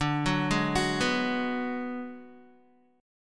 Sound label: D 6th Flat 5th, Guitar; 9,9,8,11,11,x; 4F,4A,5C,5G,5C,6E; arpeggiated; JGuitar